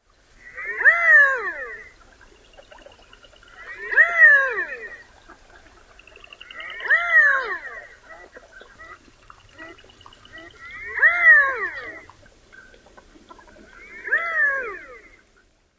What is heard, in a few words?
male mew calls